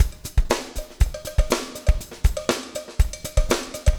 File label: breakout 120, 120bpm loops